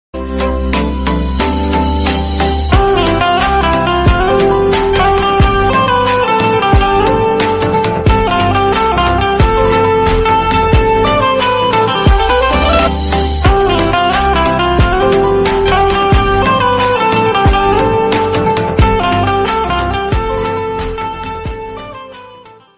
marathi song ringtone marathi ringtone love ringtone